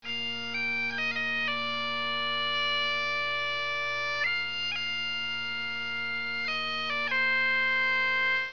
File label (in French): biniou braz, cornemuse